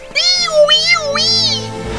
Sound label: weeoo